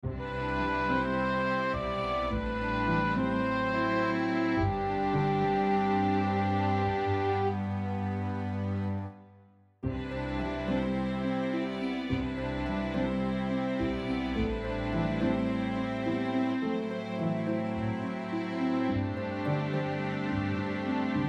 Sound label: queue music